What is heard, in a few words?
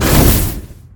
telegraph; xinalized; calamity